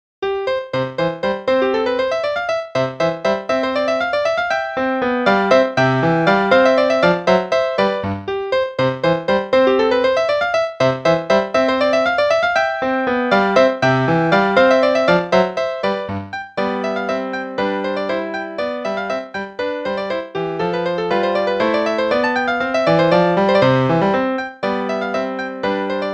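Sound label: Play-along, Capriccio, quarter note=120; Hassler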